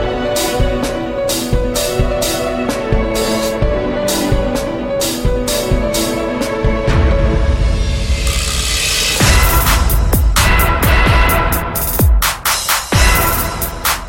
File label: Electronica Space